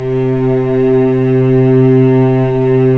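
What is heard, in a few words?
cellos; String Master Series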